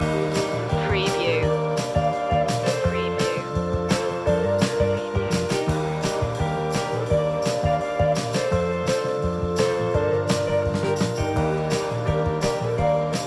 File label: summer ride loop